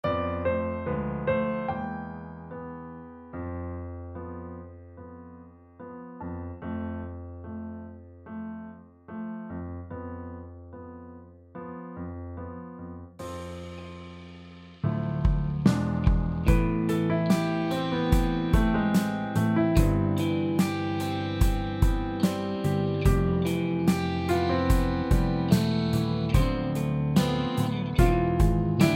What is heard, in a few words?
ballade